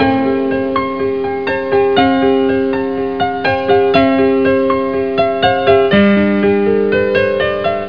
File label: Piano loop; Moonlight and Valentino Kit